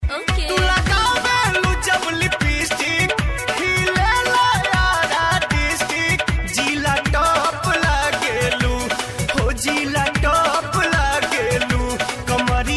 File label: Best Bhojpuri Tone